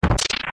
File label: stone; zsdx